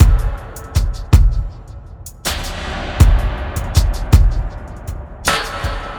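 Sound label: Oblivion, ambient; эмбиент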